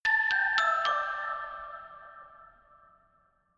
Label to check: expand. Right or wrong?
Wrong